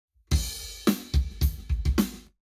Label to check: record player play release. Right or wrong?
Wrong